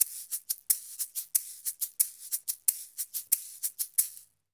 Eggs 120; Best Service; Festa Latina VOL-1; Drums & Percussion

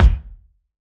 BD, BASS DRUMS; EdgeSounds

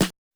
snare, Hardcore Breakbeat; Big Fish Audio; Hardcore Breakbeat Action; Decimater

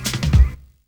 garage roll; 90 sSampleCDs; Crypton - DrumBase5000; GARAGE STACK

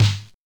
Oberheim DX

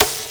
Snr Buzzfly; Zero-G; Soundclash

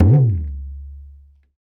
African Perc

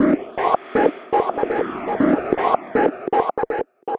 Rhythmic (120BPM); musicradar

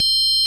ORG VOX C, Keys of the 60s and 70s, Continental; Roland LCDP09 Keys of the 60s and 70s 1

